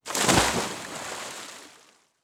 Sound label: Water Splash; Survival Game Sounds - Lite Edition; Environment